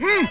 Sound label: humh; Dragon's Lair